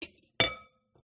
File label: whisk mixing bowl clatter